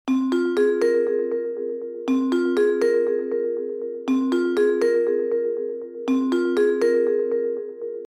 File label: tone